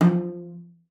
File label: CELLO FINGER F3; Xsample VOL-5